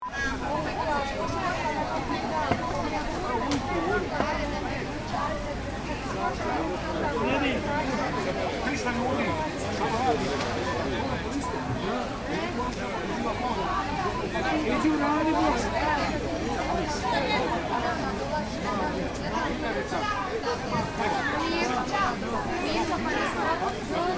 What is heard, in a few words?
Croatia Fruit Veg Market